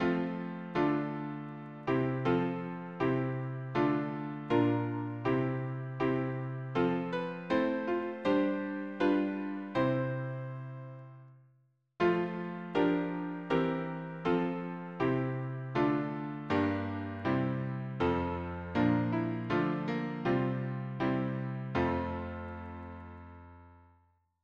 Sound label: harmony piano